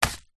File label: seeds packet black chia set down on wood counter; food